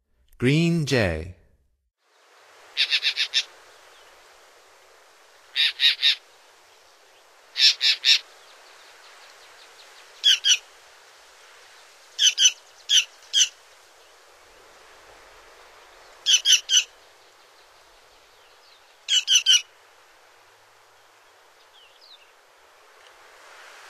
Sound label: Green Jay, Songs of Birds of North America; Stokes